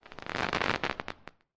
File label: crackle